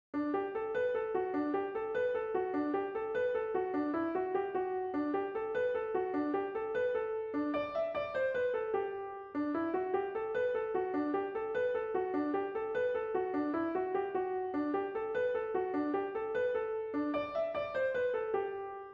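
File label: Jig MELODY with 3rds 5ths; Crooked River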